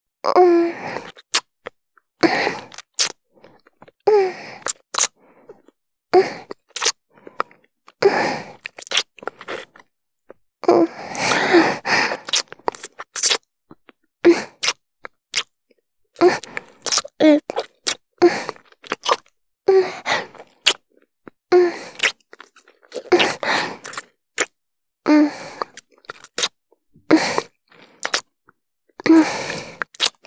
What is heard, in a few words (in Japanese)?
受け身; キス